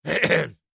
eh hem

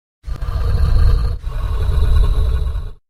breathing

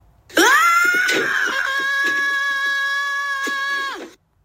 falling down; arthur

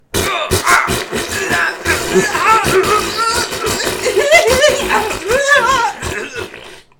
hehe fartttt

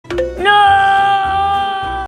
noooooooooo

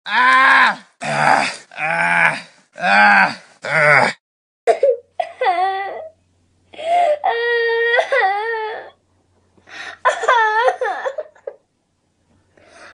struggling soldiers grunting

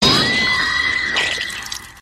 louder squish

